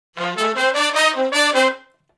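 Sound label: Shuffle Blues Riff (F) unison, Horncraft for R&B Sections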